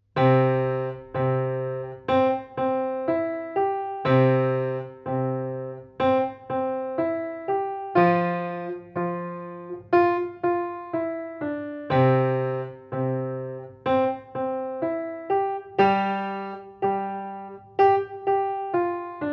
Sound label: Piano Junior Rock